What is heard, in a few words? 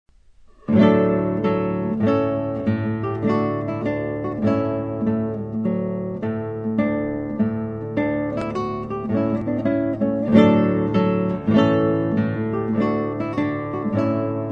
Trumpet Tune Solo